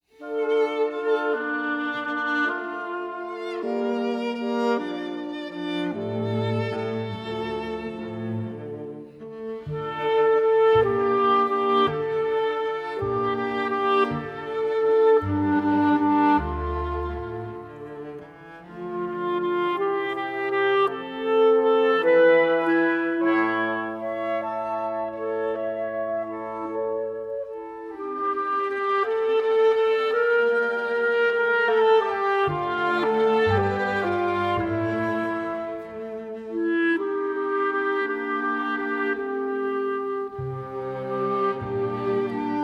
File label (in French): instrumental et melodie